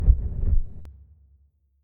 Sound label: heartbeat; Ludum Dare 46